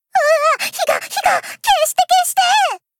Alarms Fire Alarm; Maya; World Of Warships; Arpeggio

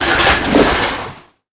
foot metal slide start; footsteps; Call of Duty Modern Warfare

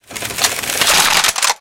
gunner reload multiple

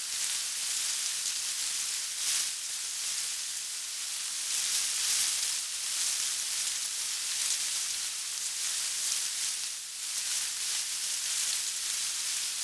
ambience local leaves in wind; Rust

